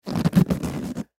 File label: Rubber Stretch, Rubber Stress Stretch Rub; Rubber Thick Stress Stretch Rub; Miscellaneous Office; Blastwave; Foley